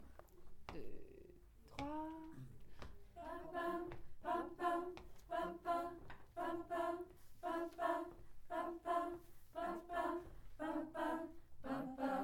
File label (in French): VOIX Alto